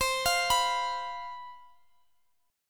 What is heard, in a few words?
C Major 7th Suspended 4th, Guitar Drop D